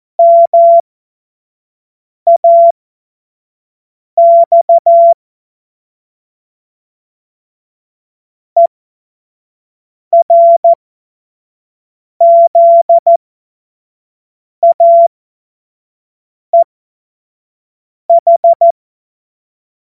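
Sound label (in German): Witz, cw morsen; Morsekurs; Witze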